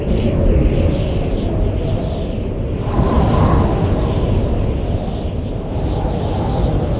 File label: windy